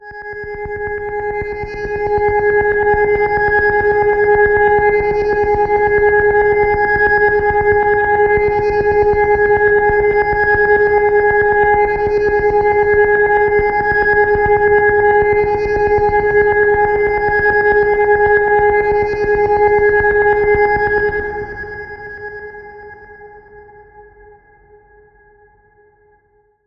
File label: Atmosphere C 138 BPM, psytrance; Minkstix